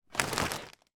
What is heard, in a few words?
foley present wrapped pick up